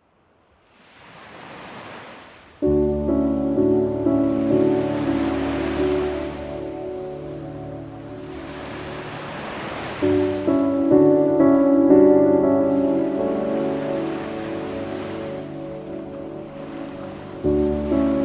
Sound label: Sleep Meditation Ocean Waves; آهنگ های بی کلام دریا